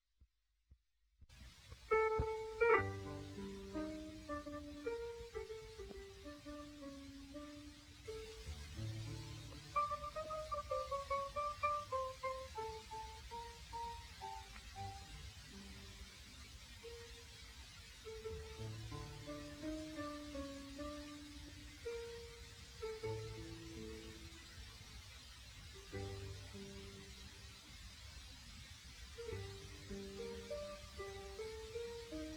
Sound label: Piano Solo Poor Audio